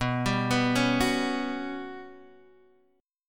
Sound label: G Major Sharp 11th B, chord